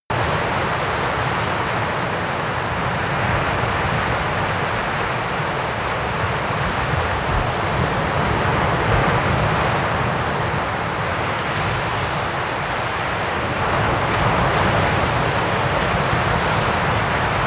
stormy sea distant

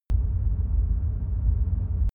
drone spaceship rumble soft

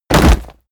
bodydrop leather

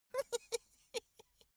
dwarf laugh sneaky

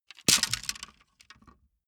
wood sticks small break

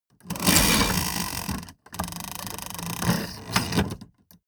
mechanics small rattle

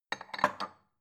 bowl shelve soft

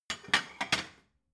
plate shelve slide

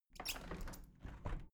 window plastic squeak open soft sequence

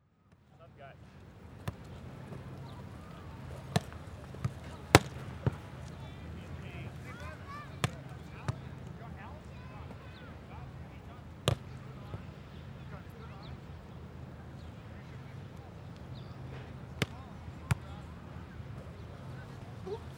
beach volleyball usa

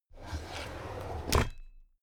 drawer cabinet crammed open regular

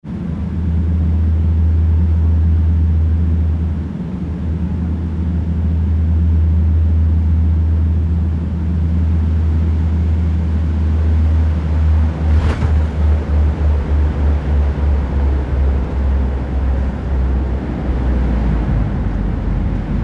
turboprop landing

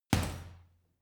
boxing punching bag uppercut hard

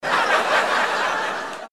laugh

